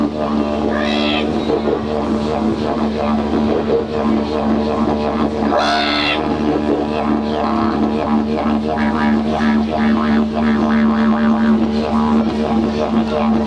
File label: didge